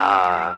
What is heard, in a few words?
"ah", male; Voice; ooh ahh